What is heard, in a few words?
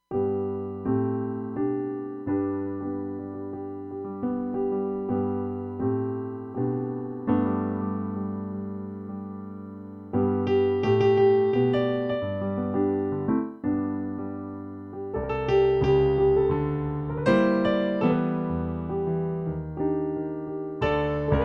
Piano